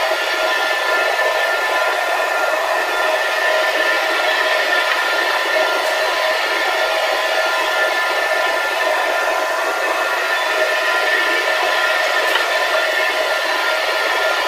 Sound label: Giant Pillar Pods Active Hum; Titanfall 2; Boomtown